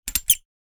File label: High pressure handgrip sprayer single press; hand tool